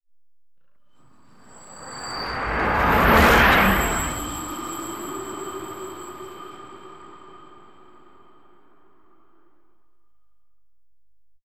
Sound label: train by pass by with layered dog growl squealy